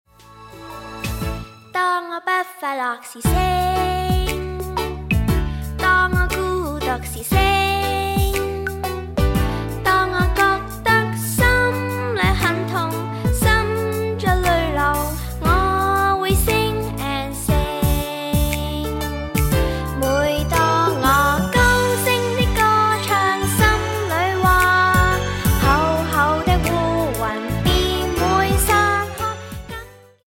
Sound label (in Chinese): 兒童詩歌